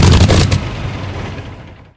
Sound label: engine stop; War Thunder